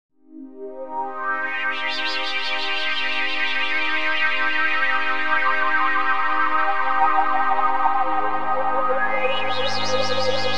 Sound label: with external reverb; ZynAddSubFX early history; historical sounds